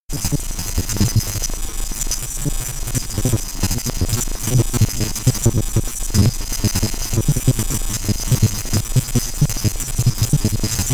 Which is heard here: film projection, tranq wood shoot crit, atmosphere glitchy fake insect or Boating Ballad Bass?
atmosphere glitchy fake insect